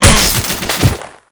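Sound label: guardian beetle attack, monster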